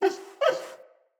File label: Wolf Cub Trapped Call Far; Animals; Wolf Cubs; Orengal Wolf Cub A